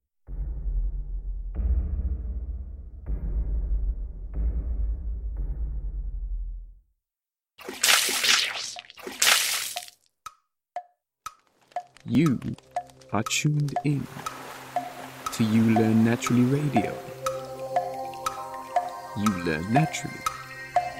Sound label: Radio Jingle; uLearn Naturally Radio